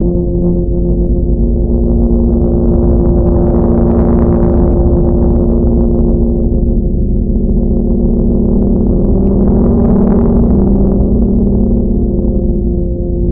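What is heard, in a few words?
Ambience gate Loop; Amb Nyxgate Loop; underworld ascendant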